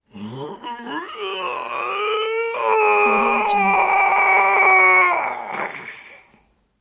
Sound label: male long exertion groan